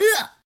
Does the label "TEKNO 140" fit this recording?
No